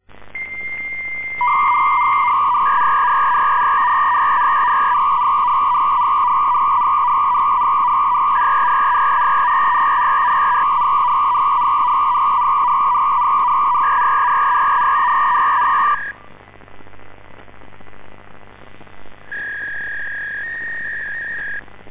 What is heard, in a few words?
v34 data to fax, sound of modems